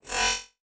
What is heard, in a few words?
Multimedia Internet CD-Rom Flash Click Multiple